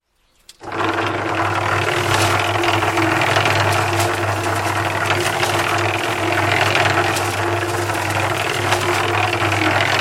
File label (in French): bruit betonniere; table ronde nuisances sonores troubles du voisinage